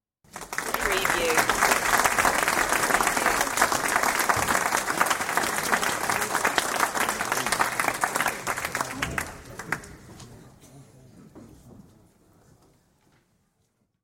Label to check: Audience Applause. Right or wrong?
Right